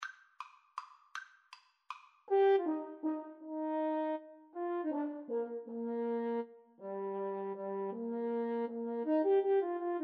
duos menuett, French Horn Duet

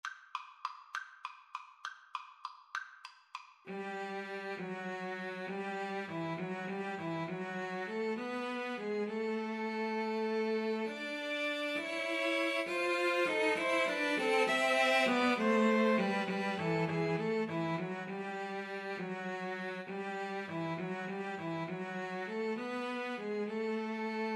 Piano Trio

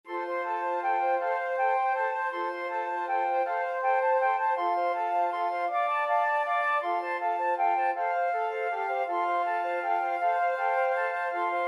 flute quartet

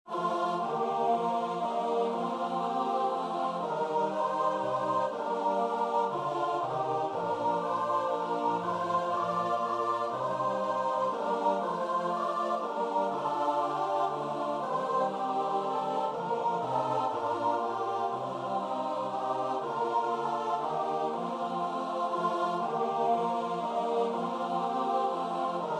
choir

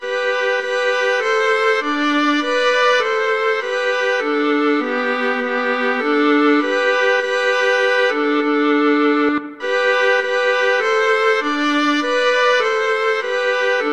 beethoven ode to joy, viola duet

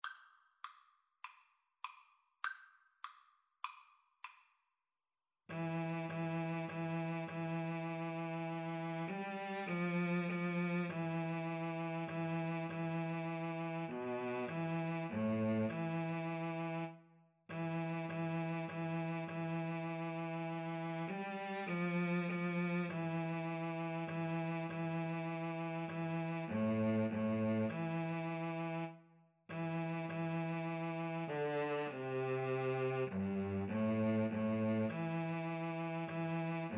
theme, Violin Cello Duet; tallis

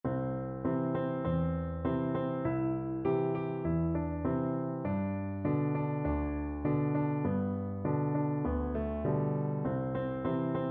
piano, piano duet; little indians

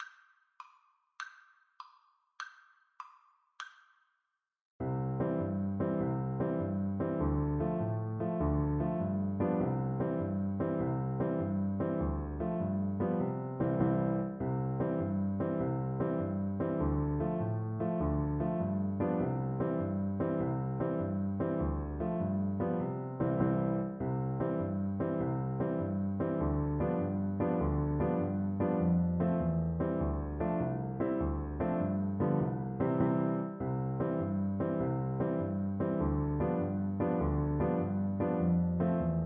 irish jig medley, Trombone

